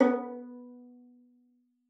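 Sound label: violin pizzicato B3; Xsample VOL-5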